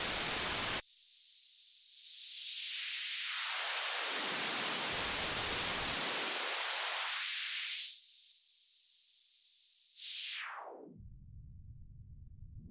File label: MXR Filter BP